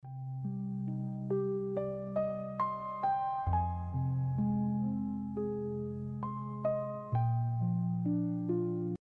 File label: BGM